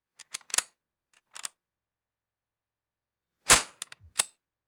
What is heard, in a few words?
double barrel shotgun loading sequence; guns